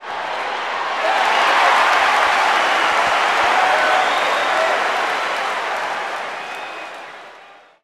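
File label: Basketball Crowd Cheer; SFX Kit; Crowds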